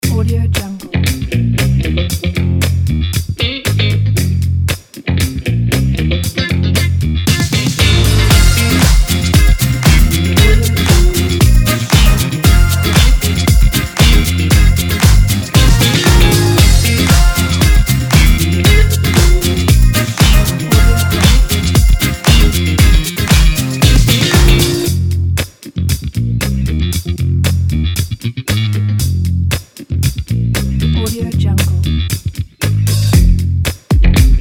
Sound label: funk